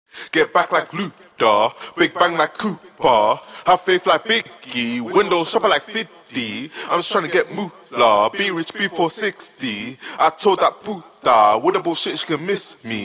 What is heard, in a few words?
Acapellas trap 146 Fm